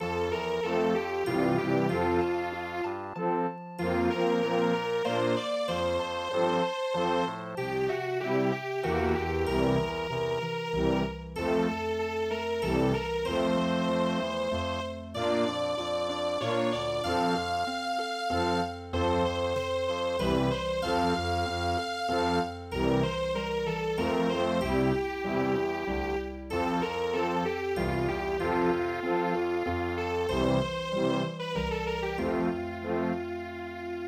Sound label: waltz, midi; Mozart komoriuta